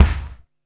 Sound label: SCRATCHR, KICKS; Back In Time Records - Big Bang